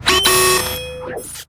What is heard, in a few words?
Negative Sound